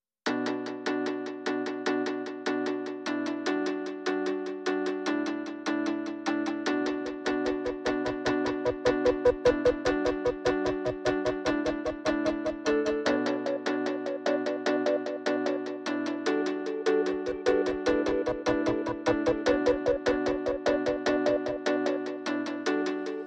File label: Pads and Pulses